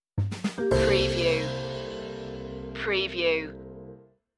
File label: jazzy stinger